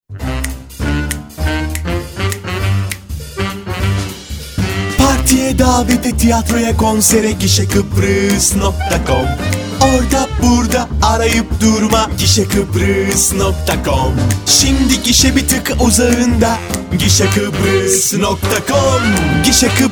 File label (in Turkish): Gişe Kibris Jingle; Kaşeli